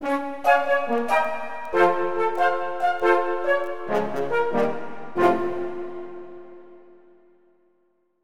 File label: Jingle